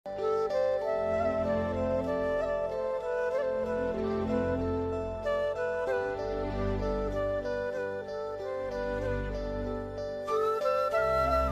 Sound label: love bgm ringtone, heart touching bgm; premalu; mamitha; naslen